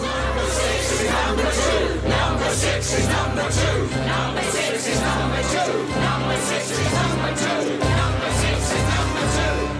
chant; Prisoner; BigFinish; Free for All

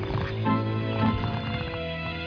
growl; Cheers

